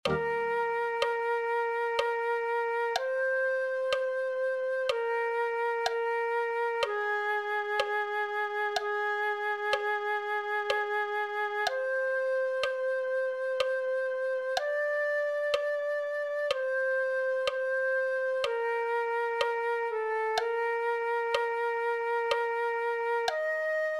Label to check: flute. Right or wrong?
Right